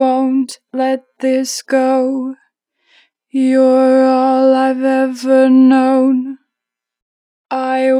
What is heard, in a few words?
I wont let this go, 4 bar vib, Vocals, Natural Vibrato; Harmor Vocals